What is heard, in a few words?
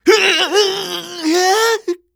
male damage